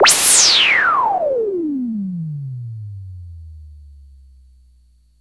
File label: NOISE SHOT; Best Service Alpha Dance vol.2